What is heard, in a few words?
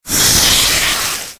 chillkin attack; mobs